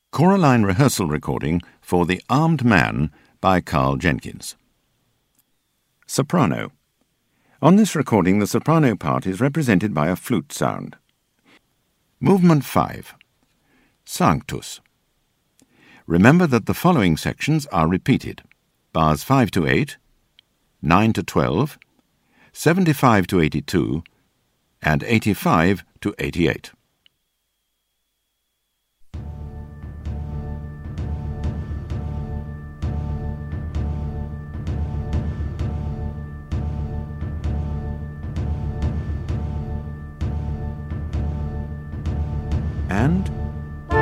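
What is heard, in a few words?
Soprano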